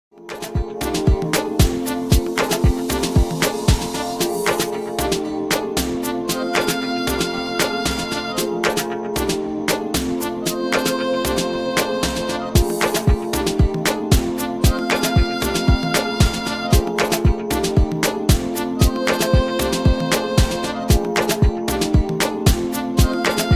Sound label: violine